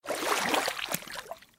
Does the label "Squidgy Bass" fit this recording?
No